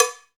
CowBells

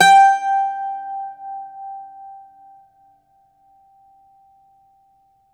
IRISH, Old World Instruments; E-MU Producer Series Vol. 7 – Old World Instruments; World Combos; Ireland

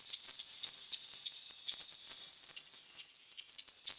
Noise Loop, sparse soundscape

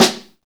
snare, Roland

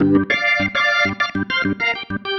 Pattern, Organ

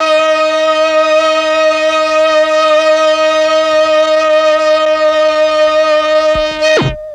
MEL STR, Legendary M400; ILIO; Propeller Island

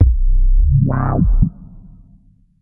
Wah, Synths BASS

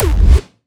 goth kick

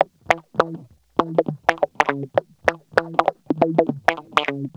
Guitar Loop; Ambient Grooves

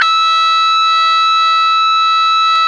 GTR, Dirty; Club-50 - Foundations; Paul-Stack

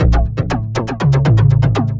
synth arp; Arpeggios; Electrocution

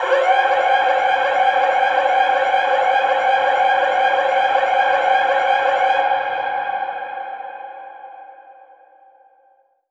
Echo Proc, alarm siren, 120bpm; musicradar

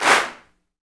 CLAPS, Africa Handclaps

